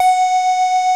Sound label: CS-15; Masterbits; Vintage Voltage; LEADS